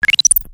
Launch Pad Beeps; gnarled forest

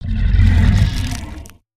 howl

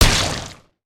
Banana Gun impact

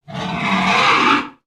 Pets Triceratops Beg; creatures; Lego

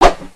pet jump; creatures; pets

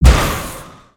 baby spider hit; creatures mechanical

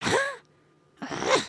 indignant, emotes

